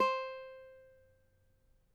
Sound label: CAVAQUINHO C, Guitars; Spectrasonics; Hans Zimmer Guitars VOL-2